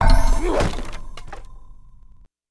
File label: Build Aztec Jaguar; Civ4 warlords